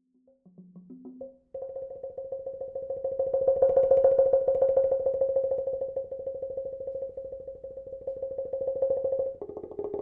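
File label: Prehispanic Percussion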